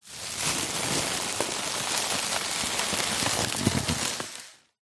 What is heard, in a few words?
foley plastic bag thin empty blow up inflate